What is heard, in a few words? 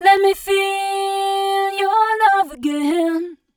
f mel d, fem Vocals